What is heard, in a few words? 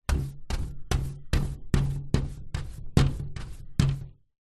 household brush hand held sweep glass window; zapsplat